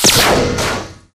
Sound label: arc titan hit; Titanfall 2